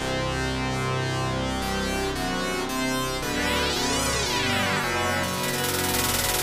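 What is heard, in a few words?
background music; Last Duel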